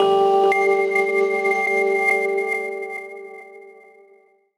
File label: VIB WHIZ FX; Rawcutz; Nu Forms